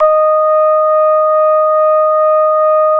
SLAP D#1, Bass; E-MU Producer Series Vol. 6 – Analogia Project (CD 1); Boom da Bass 1

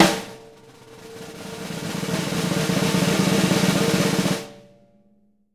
snare roll, JZ XSNARES

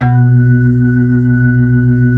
B-3, Blues

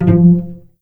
F2 PIZ CEL, string; East West; The Ultimate String Collection

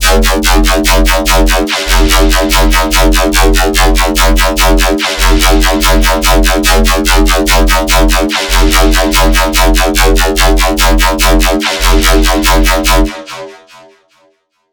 disperser riddim, bass

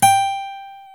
GTR, Dual Guitar; Club-50 - Foundations; Paul

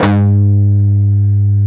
PRS PWR A2C, Guitars; PRS PWR CUTS

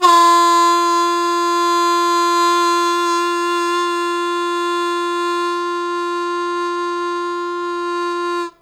Loud Attack Sustain F1, Harmonica; Zero G - Harmonica

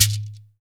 SHAKER, Heart of Africa; Spectrasonics; Heart of Africa 1

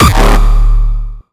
frenchcore thingy D, kick

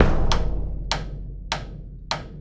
Big Drum and Sidestick; Sonic Foundry (Sony Creative Software); Essential Percussion; Drums; Big Drum Combos

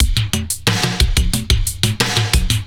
90 DRUMS

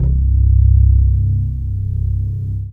Dance Floor Bass, Multisample Basses

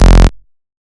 Bass F, Bass Synth

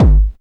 techno 2, bassdrum; Ueberschall; Techno Trance Essentials; DRUMS; BD TECHNO2

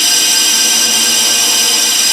A#4 VAPOR; Northstar; The Wizard VOL-1

